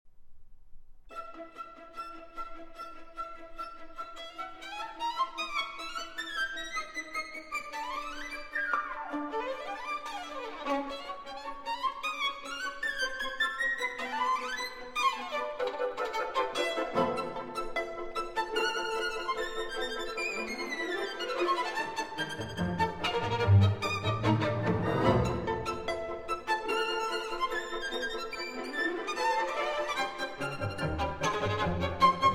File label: Violin Concerto No. 1 in D Major, Scherzo. Vivacissimo; Prokofiev; Op. 19